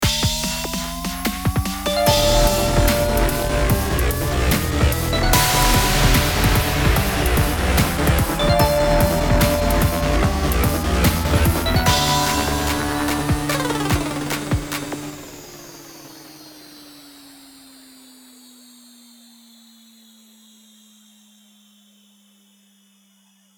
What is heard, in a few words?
electro hype